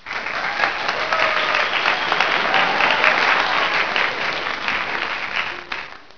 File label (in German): applaus